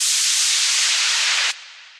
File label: Swish Descend Pulse, Effects; Drive and Glow; Ableton; Factory Packs